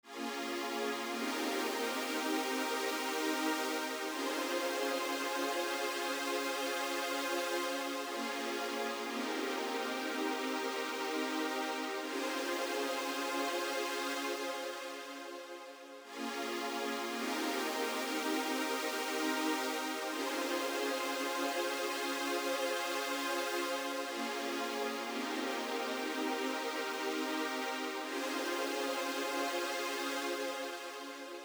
pad A, Smooth RnB, Abmaj

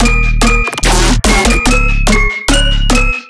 bass loop bells 145 D#; memphisbells; Virtual Riot

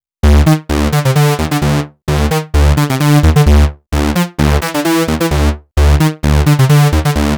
NRG Bass, Trance

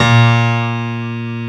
A#1 STR PIA, G.PIANO; AKAI S-Series CD-ROM Sound Library VOL-7

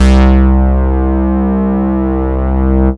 Bass Note C

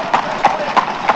hooves, animals; M8; CMI Factory

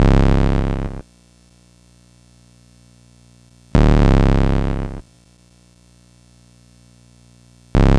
Atari 2600 Bass, chiptune

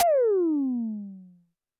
TD-20 Tom A, Roland TD-20 Toms

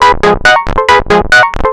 138 G#, pure-synthl, Techno; instrument-loops

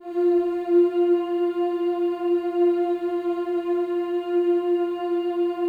F4, CHOIR; Spectrasonics; Symphony of Voices

